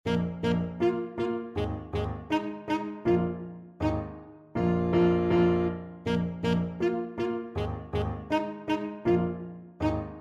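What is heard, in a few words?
thumping blues alto saxophone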